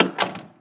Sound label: door close